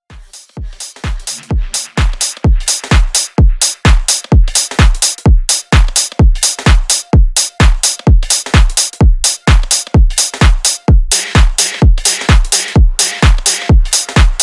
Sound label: Bass House Mash up